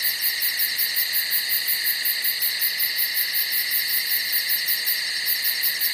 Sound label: cricket loop; video game; Left 4 Dead 2; Source Engine; ambient